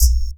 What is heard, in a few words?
Subtle Snare; Sonic Foundry (Sony Creative Software); Esoterik Beatz; Subtle Kit